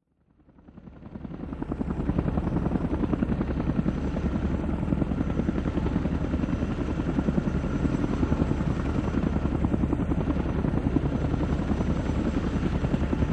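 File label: CH46 Chinook Idle On Ground, Medium; Ch-47 Chinook: Idle On Ground, Medium Close-up. Stereo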